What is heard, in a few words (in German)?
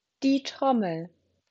Trommel